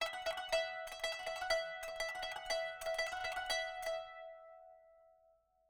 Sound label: kanun